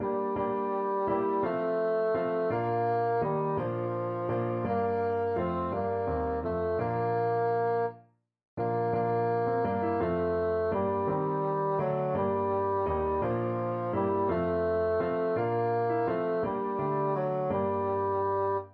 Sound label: puer natus, bassoon